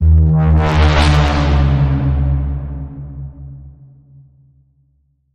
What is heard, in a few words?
Bend, D sharp